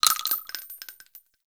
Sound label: Pistol Shell Droppings; weapons; Warframe; Grineer; Orokin